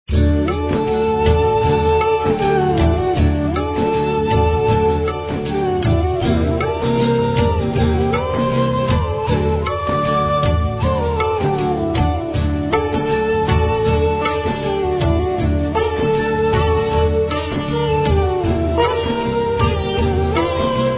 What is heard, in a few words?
music